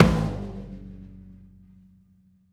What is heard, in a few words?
Snare, Gong, Kick; Essential Percussion